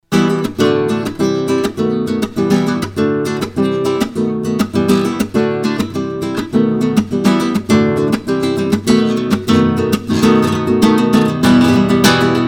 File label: nylon rhythm